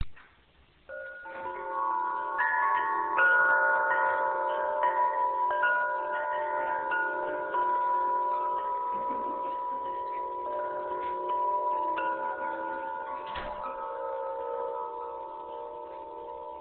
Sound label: Chimes; MorningGlory